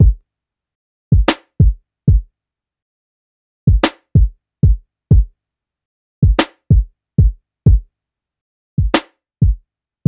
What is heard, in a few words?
Drum Loop, Kick & Snare, 94